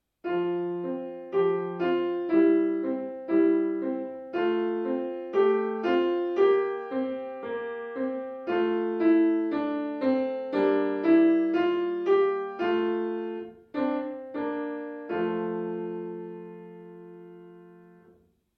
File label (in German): Gemuetlich in F-Dur, klavier